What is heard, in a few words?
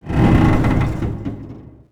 dfa land stress